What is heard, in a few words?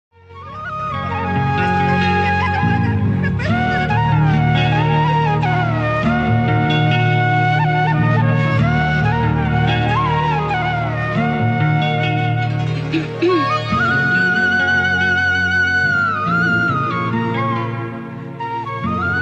bgm ringtone background tone flute ringtones; shri krishna murari